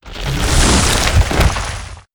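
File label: flower death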